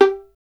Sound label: STR VLN, Solo Instruments; Miroslav Vitous